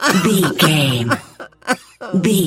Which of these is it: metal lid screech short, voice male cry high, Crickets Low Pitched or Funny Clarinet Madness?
voice male cry high